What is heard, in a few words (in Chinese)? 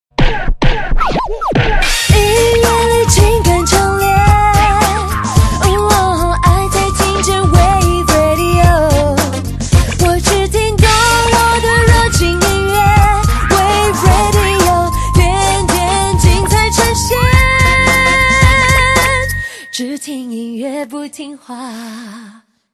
HipHop版